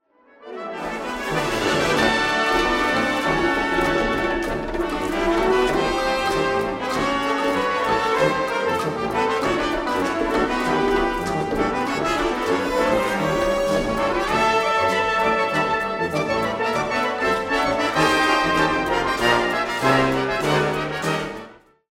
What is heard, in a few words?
Cuban Ouverture excerpt, brass